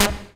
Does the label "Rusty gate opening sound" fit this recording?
No